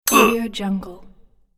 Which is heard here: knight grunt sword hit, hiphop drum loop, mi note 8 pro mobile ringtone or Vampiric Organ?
knight grunt sword hit